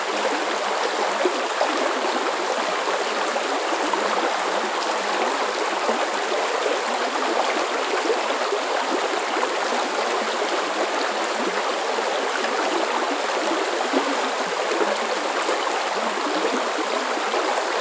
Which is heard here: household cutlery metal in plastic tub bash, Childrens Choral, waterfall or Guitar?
waterfall